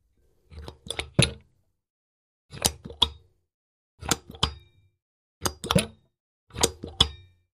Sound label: Wash Basin Trap Open, Close